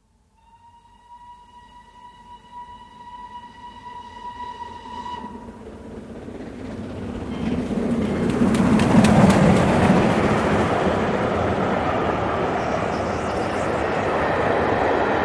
Steam Train passes at very high speed on welded track, left to right (Vintage reprocessed recording, simulated stereo); BBC